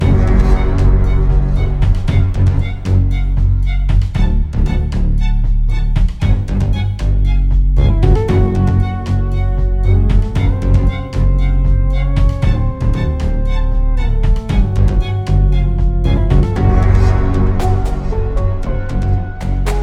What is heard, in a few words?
Boss Ghost; The Jump Dimensions